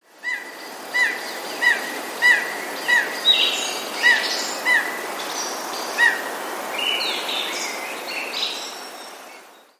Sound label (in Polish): sojka; krótkie, dźwięczne okrzyki